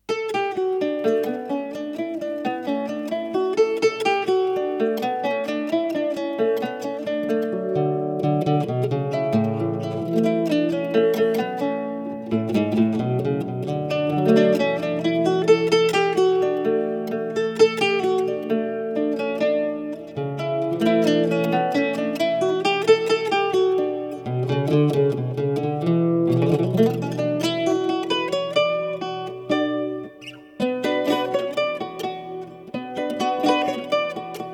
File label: lute